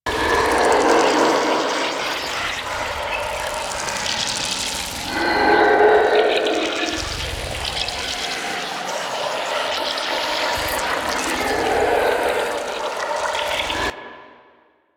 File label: Creepy Wall Eye Loop; Warframe; Man In The Wall